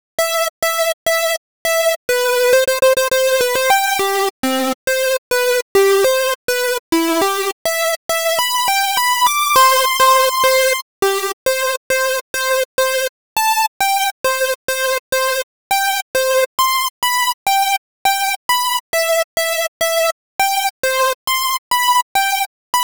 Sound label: Serum Super Saw + 3x Square